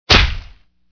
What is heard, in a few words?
punch